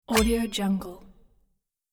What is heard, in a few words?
fight sword whoosh light hit